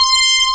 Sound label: JUP8 SYNC, Jupiter Synths; E-MU Formula 4000 Series Vol. 3 – Analog Odyssey